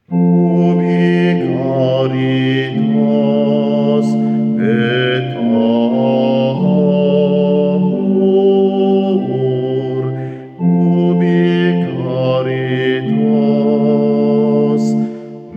Ubi caritas bassi

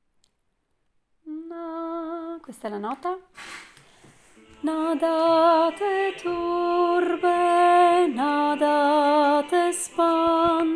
nada te turbe soprani

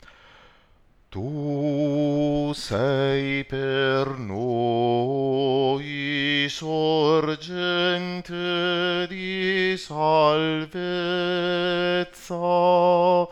Bassi

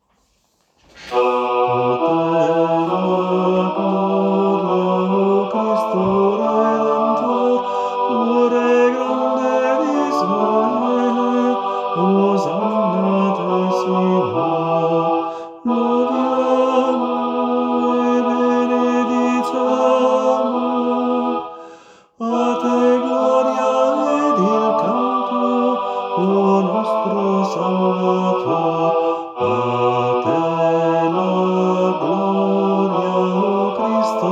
Tenore

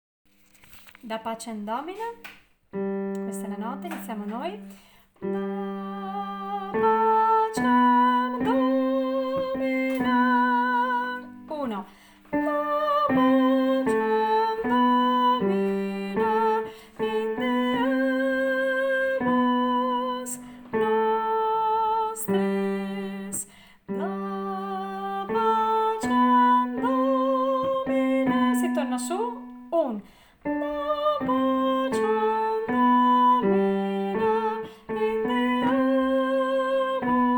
da pacem domine soprani

